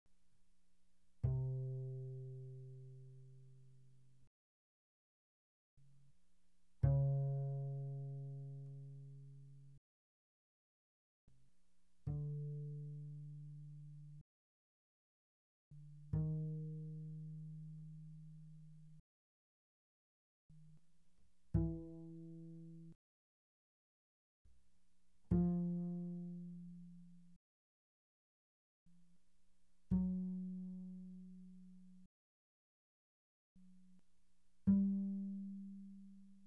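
Bass pizzicato mezzo forte sul G C3 B3, doublebass; Strings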